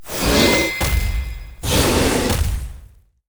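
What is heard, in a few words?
Boss Gorilla spawn, monster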